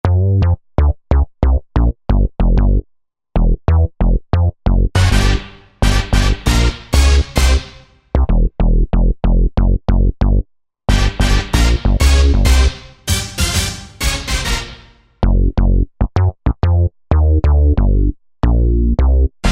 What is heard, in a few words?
Power HIT Split, Korg TR-Rack